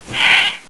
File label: Familiar Attack Sound; Heroes3